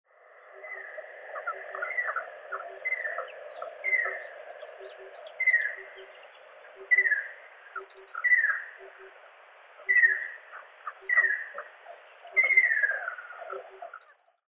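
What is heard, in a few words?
ruddy kingfisher; akashobin; okutadami; koe; abe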